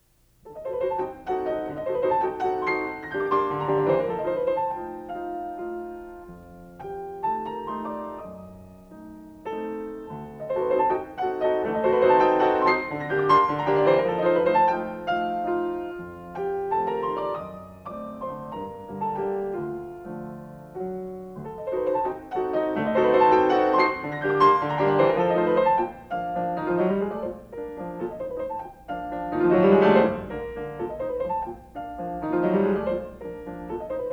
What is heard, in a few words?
Carnaval for piano; Schumann; Op9